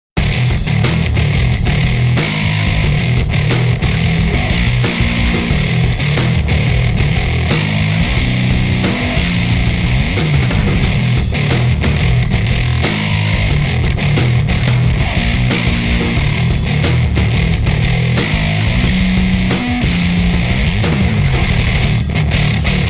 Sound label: Tone Demo Bass